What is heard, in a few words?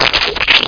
Slimed; Realmz